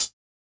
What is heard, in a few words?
hat, closed hats